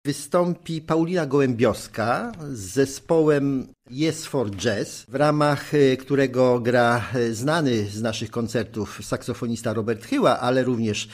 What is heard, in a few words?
jazz